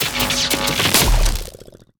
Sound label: enemy storm death; sfx